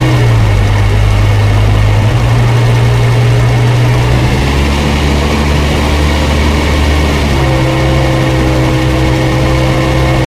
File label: hammer drive; blockade classic